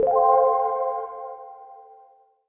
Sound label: Bowling Close Jingle; wii sports wii